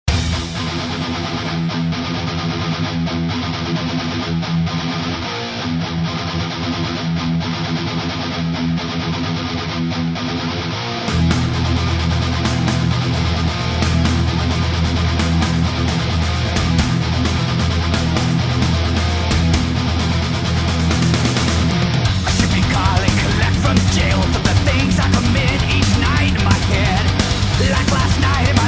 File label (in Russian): общий микс (без мастеринга)